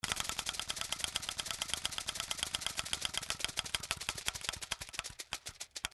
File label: Spinning Super Wheel; Panda Majesty2